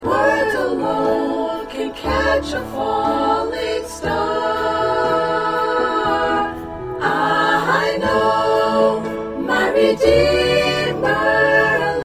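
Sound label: soprano alto tenor bass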